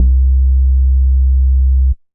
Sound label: Slow Pull Bass; Ableton; Factory Packs; Chop and Swing